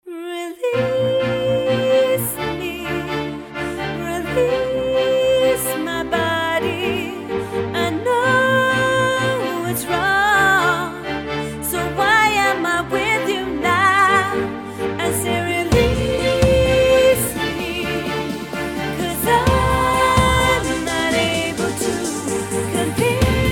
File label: vox